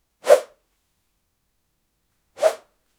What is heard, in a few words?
clothes were yanked off w